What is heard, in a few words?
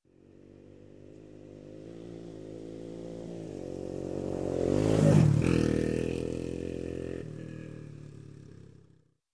Motorcycle, Atc Pass By, Fast; Sound Ideas; Series 2000